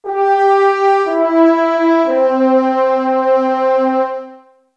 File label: 3 klang french horn; echo